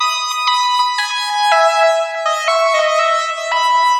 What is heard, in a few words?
xtra lead; Sonic Foundry (Sony Creative Software); Ugly Remnants VOL-2